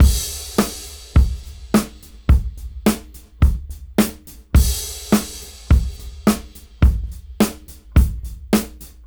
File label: Straight 105 BPM; AMG; Keith LeBlanc Phat Butt Jams